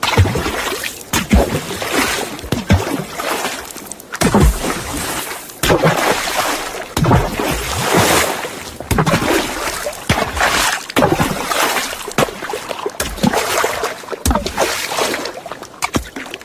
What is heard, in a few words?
Splash Loop, Water; The Avali's Audio Overhaul